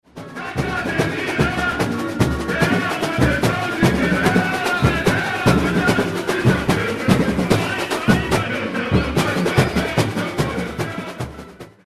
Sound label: Fist break (for surdos)